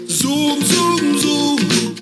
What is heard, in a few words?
song